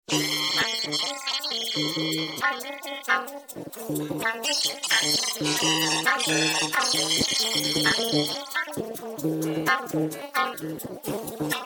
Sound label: Barbieri ensemble